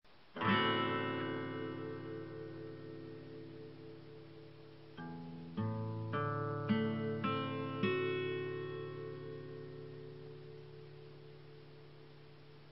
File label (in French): Accord D